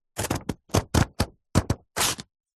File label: foley paper sheet cut with knife; zapsplat